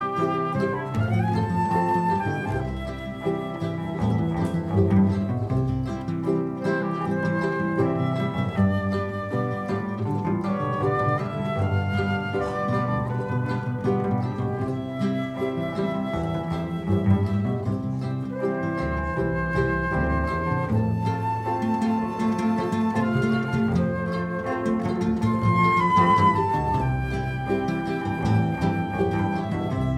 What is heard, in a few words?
Krontjong